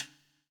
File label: POWER RIM